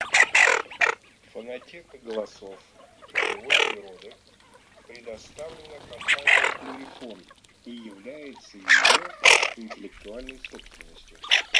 Хохлатая чернеть Moretta; plurifon

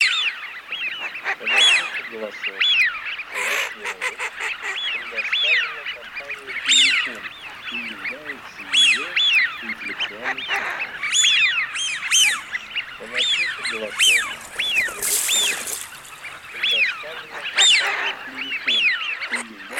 Свиязь Fischione; plurifon

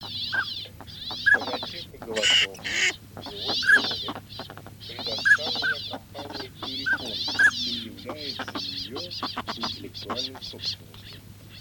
Шилохвость Codone; plurifon

